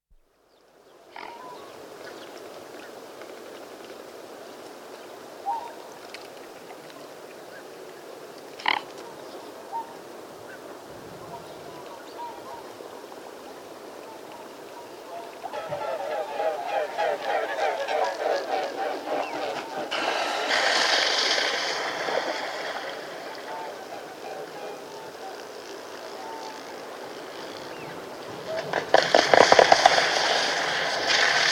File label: Mute swan, calls wings whistling in flight, landing on water, whooper swans and wigeon in background; Bird; BBC